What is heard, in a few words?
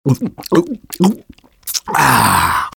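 engineer guzzle; vo; taunts; tf2; engy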